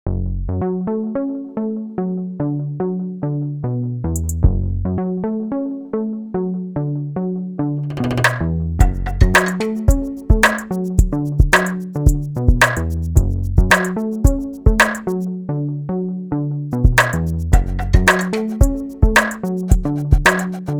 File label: HipHop